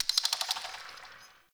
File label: Mid Bird; Ambience